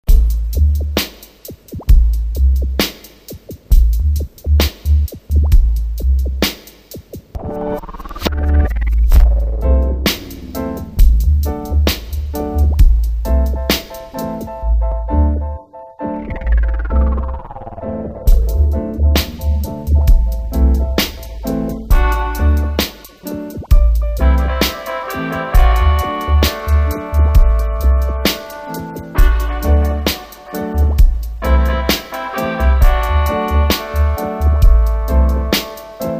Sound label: Dub Remixes; DubOrganizer; tubby